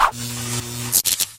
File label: wiper